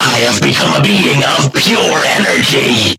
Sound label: Game Over